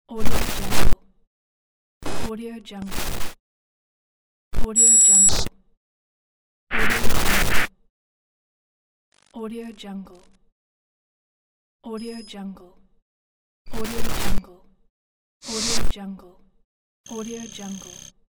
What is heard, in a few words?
radio static glitches